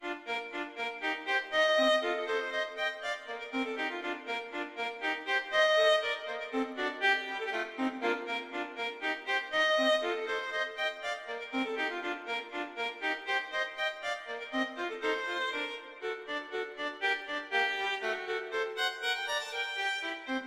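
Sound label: Allegretto, violin; Mazas; Duets; Duet 6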